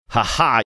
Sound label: hahah